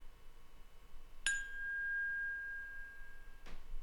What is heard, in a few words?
Phone Notification